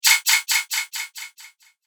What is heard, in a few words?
Arcane Magic Repulse Spell; Magic Sound Effects